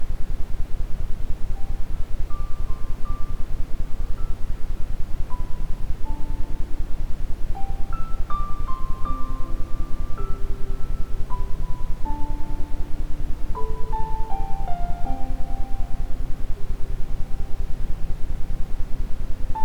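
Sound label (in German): Iso Meditation Balance Quickie mit Ambient Sound, Isochrone Töne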